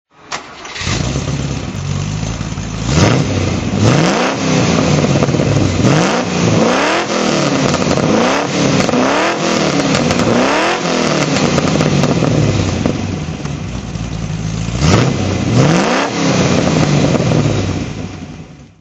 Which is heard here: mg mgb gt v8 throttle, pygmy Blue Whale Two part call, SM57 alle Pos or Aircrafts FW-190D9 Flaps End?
mg mgb gt v8 throttle